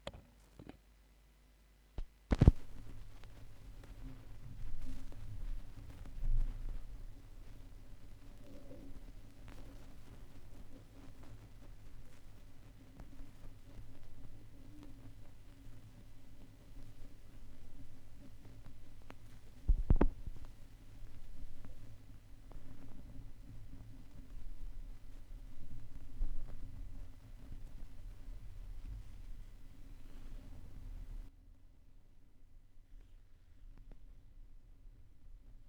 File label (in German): schallplattengeraeusche, Vinyl